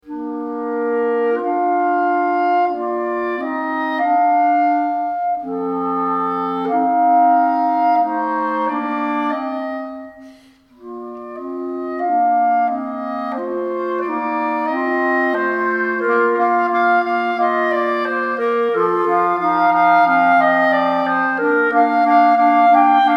trio pour clarinettes; vignon denys

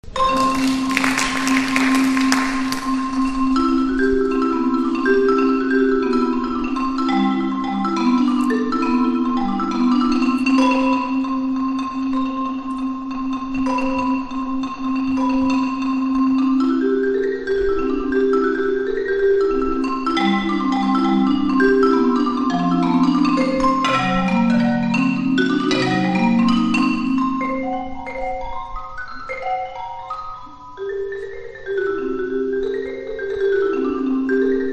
pour marimba solo

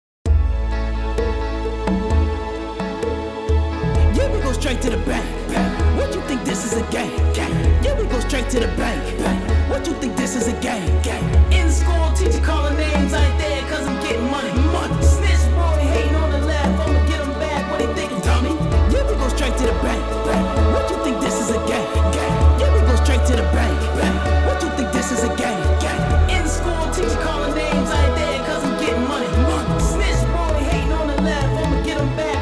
ambiance cinematique

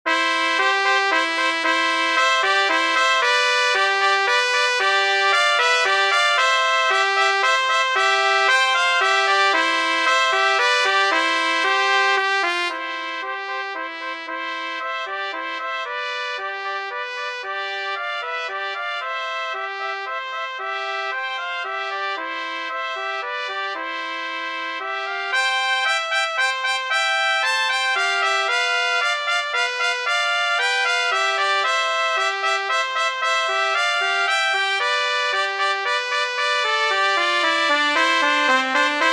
fanfare menuet

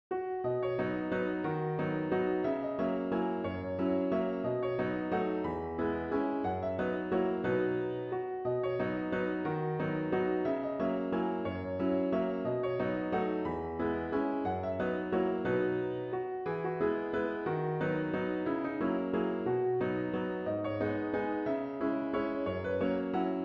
valse salon

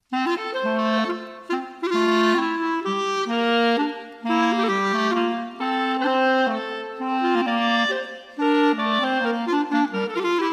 duetto pour clarinette

